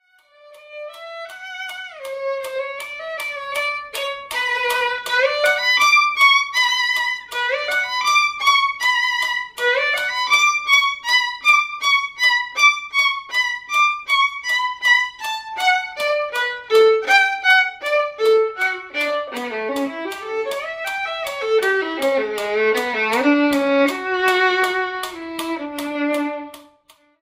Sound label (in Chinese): 第四號學生協奏曲 第三樂章 小提琴 示範演奏; 賽滋; 新版 篠崎小提琴教本 第三冊